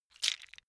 bone small handling right

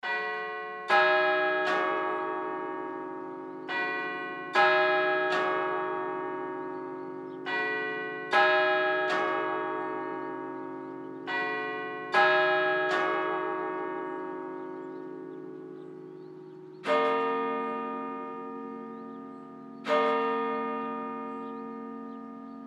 monastery bell and birds

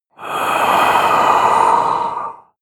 inhale male

